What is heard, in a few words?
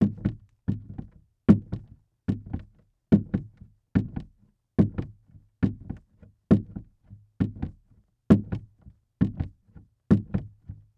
Foley Footstep Golf Cleats Walking On Wood Platform; Foley Footsteps